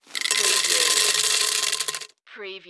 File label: Popcorn Kernels Drop